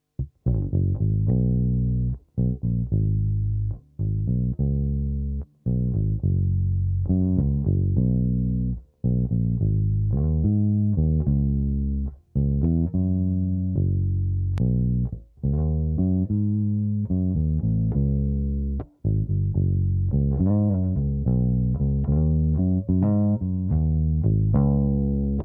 Bass

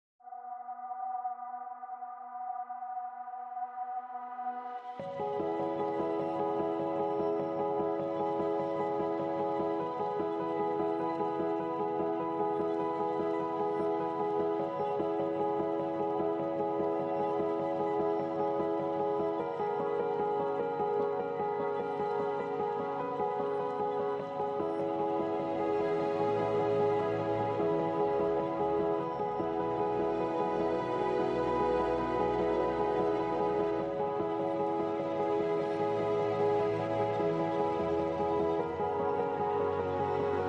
Ambient

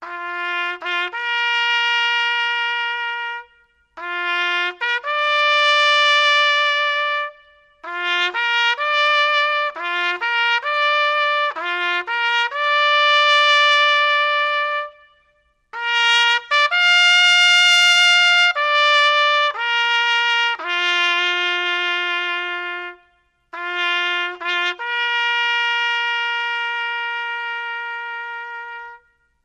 Taps, Solo Bugle Call Reverb

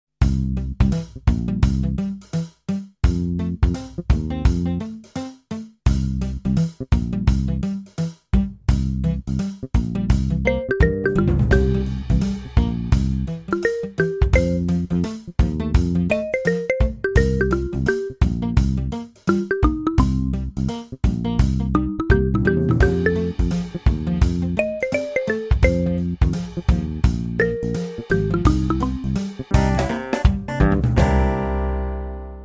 Jazz Funk

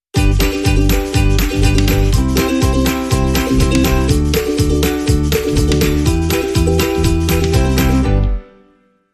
Stinger

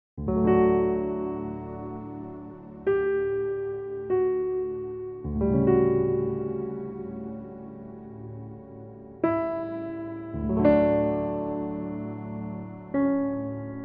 Piano

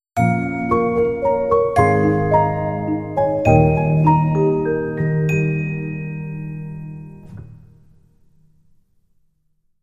Breath Taking, Sting

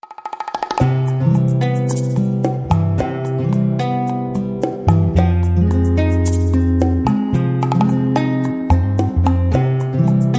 Soft Acoustic Nights

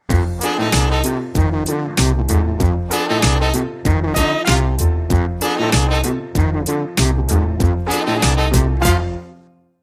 Boney Sax

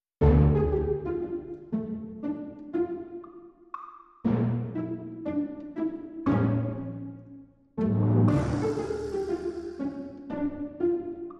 Percussion and Strings Only

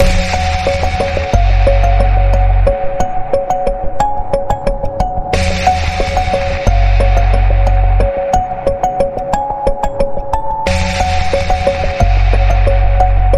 Clear Beginnings Pulse, F sharp

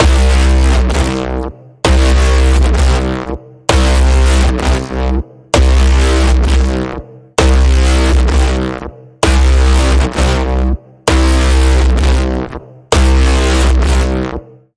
Pulsing Code Generator, C

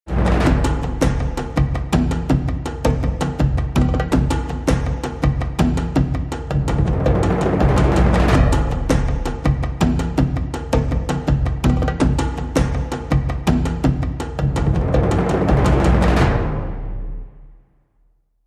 Jungle Drama

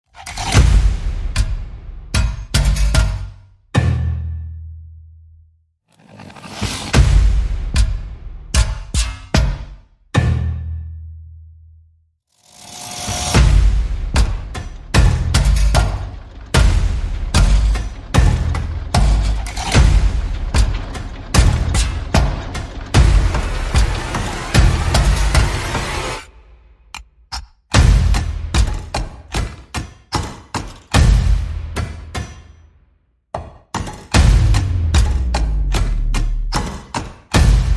Percussion and Effects

